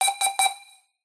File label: electronic roulette flashing